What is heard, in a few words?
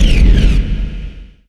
weapon fire